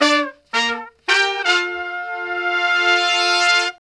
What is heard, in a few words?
BRAZ LICK, BRASS LICKS